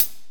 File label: Real Closed Hats